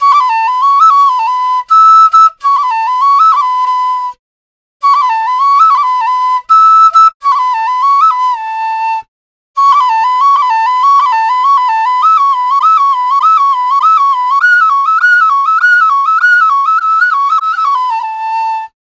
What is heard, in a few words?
EDM Flute Loop 100 BPM A